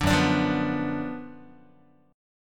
Gb Major 7th Suspended 4th Sharp 5th, Guitar chord; GbM7sus4#5 strummed; JGuitar